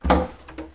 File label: wood op; Mafie 1